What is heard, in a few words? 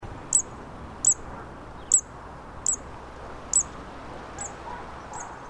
Zitting Cisticola